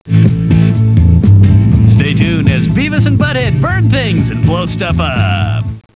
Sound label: burn blow